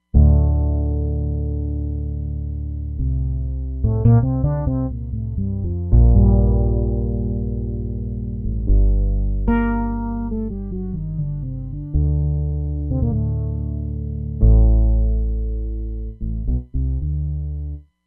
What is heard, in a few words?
FS Fretnot, Roland Fantom XR